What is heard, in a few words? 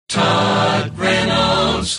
jock jingles; pams series 42a